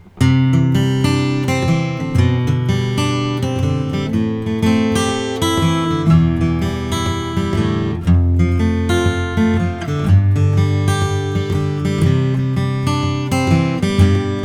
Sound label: froggy bottom k koa